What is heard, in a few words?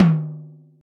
mid tom drum sample e key, Royality free tom drum tuned to the E note. Loudest frequency: 306Hz; tom drum samples